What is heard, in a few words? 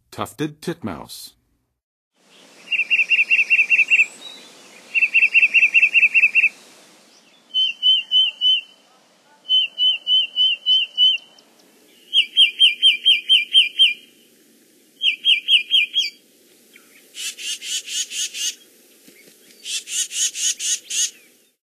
Tufted Titmouse, Songs of Birds; North America; Western USA; Western USA Songbirds